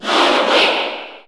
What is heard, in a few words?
Cheer English; SSBU